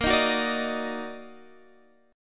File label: Eb Minor Major 7th Bb, chord